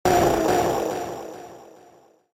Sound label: trident death